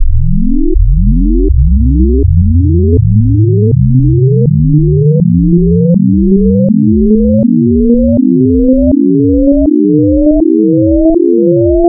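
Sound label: AM Simple Sine, wavetables, Amplitude Modulation; Architecture 32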